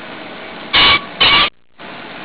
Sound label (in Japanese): kiji